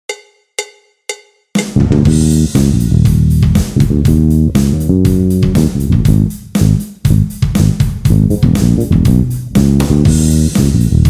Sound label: Center time with heavy drums